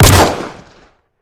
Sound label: magnum shoot; weapons; m6h2